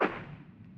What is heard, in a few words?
Footstep